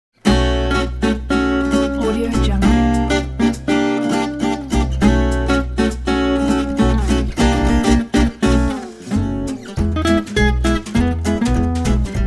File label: happy fresh swing